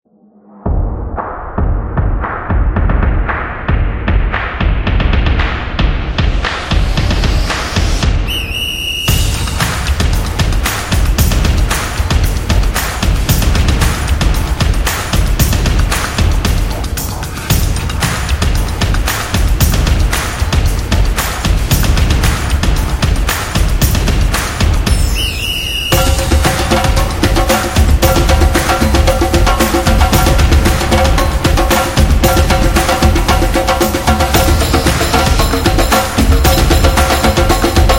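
Soccer Football, Street percussion background music built for sport, motion, and dance; percussive nation vol 3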